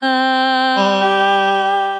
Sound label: Chromatic Voices, Basic Voices; Ableton; Factory Packs; Voice Box